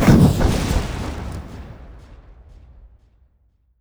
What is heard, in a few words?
npc atronach flame conjure